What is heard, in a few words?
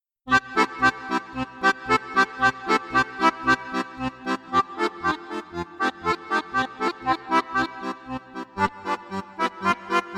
Duet